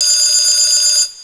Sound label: Phone